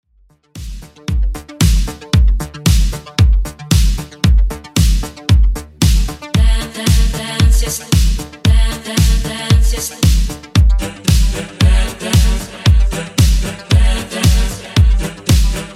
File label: 80s Redrum 114bpm CLEAN